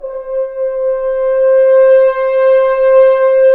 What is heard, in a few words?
BRS F.HRNS, FHns Swells; Roland L-CDX-03 Disk 2